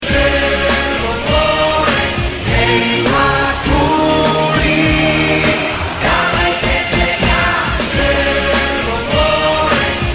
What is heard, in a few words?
Goal